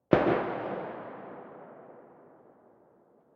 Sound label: landing gun single; smite; Ares